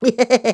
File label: clown laugh; Ringling Bros. and Barnum & Bailey; Wii